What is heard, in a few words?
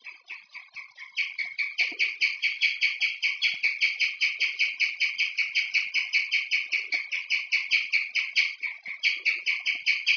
waker, cavia